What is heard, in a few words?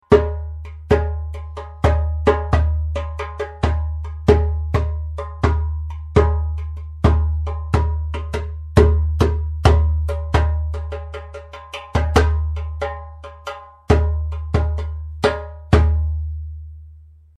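darbuka; durbuk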